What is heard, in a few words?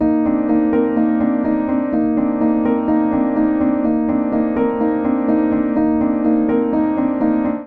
Ostinato Db Major 125 bpm, Upright Piano; Early Morning Coffee Shop; Upright Piano by Spitfire Audio; Ableton; Factory Packs